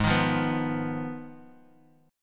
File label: F Minor 7th Flat 5th, G sharp